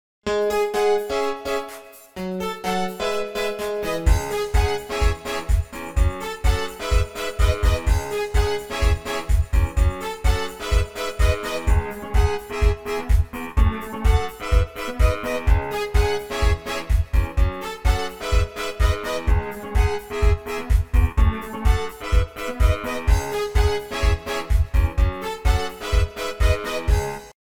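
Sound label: Motorola ringtone; ringtony motorola razr v3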